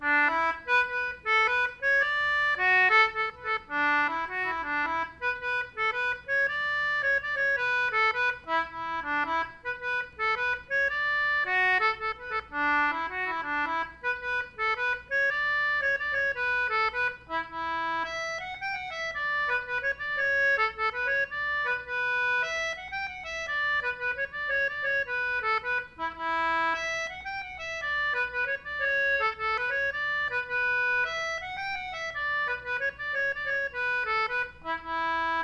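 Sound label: Celtic Button Box, slides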